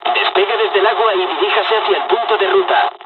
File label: hydroplane takeoff; War Thunder; dialogs spanish